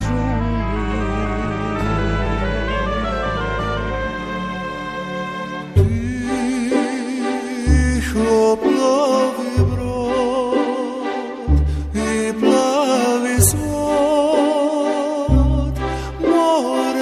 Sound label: romantic croatian